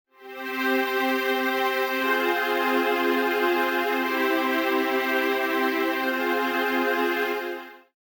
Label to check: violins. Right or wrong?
Right